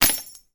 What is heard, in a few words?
household door keys hang on hook; sound effects one